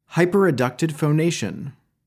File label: Hyper Adducted Phonation